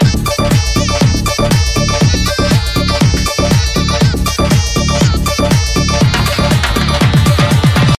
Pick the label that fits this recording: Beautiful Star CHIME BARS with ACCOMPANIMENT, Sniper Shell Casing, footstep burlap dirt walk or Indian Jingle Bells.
Indian Jingle Bells